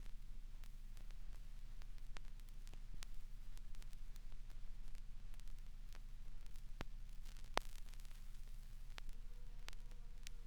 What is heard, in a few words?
crackles, Vinyl